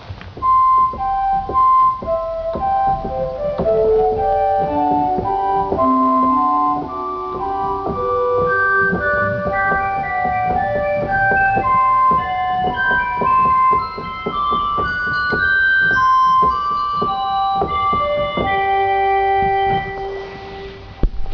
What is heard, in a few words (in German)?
Waldfloete; Waldfloete 2